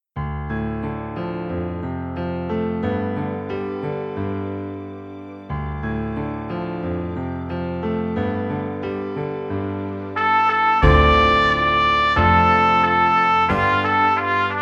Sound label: Trumpet; easy beat vol 1 trompete